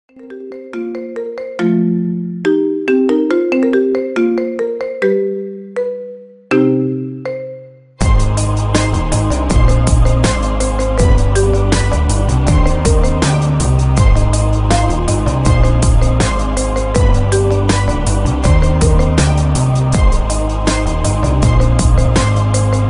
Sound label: iphone marimba remix ringtone